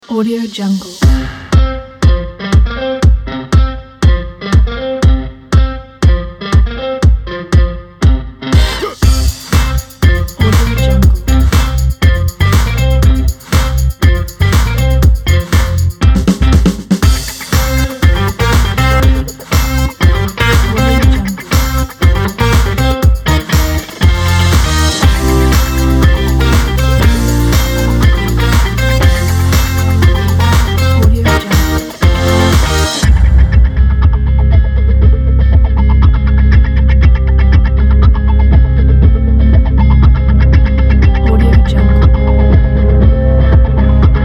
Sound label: modern power funk positive pop